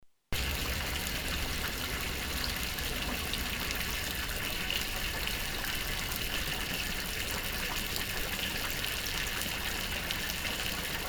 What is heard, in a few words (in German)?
Hauptplatz